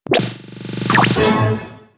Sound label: Get Card Pack; Tableturf